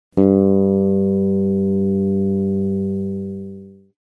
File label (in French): basse g, accordeur